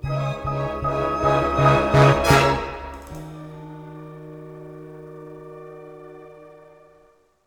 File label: Race Sting Lose; lego the incredibles 2018 pc